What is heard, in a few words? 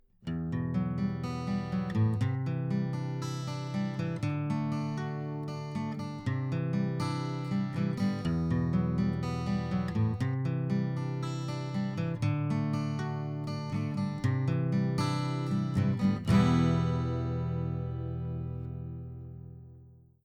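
Guitar Hypercardioid; Guitar IperCardiod; Austrian Audio OC818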